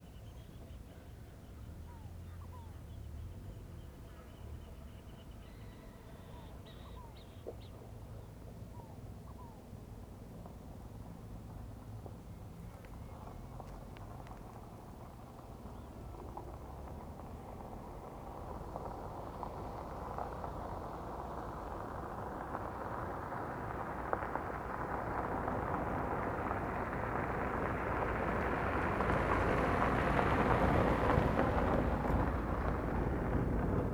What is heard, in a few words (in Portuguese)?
Carro passando pela direita em cidade pequena com passaros; maquinas; canais-stereo; colecao sonora do cerrado